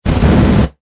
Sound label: Science Fiction Flame Booster Short Burst